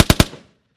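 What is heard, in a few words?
short burst from assault rifle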